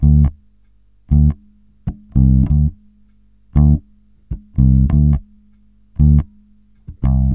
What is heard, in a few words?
SMOOTH 98 D, Bass Grooves; USB Soundscan vol.30; AKAI